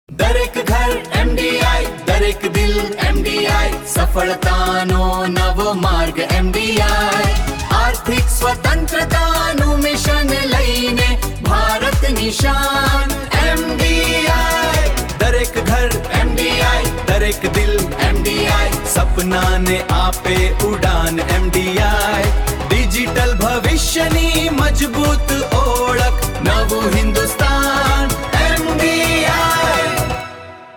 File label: Ringtone Gujrati; MDI